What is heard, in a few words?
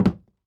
Footsteps Sneaker Wood Walk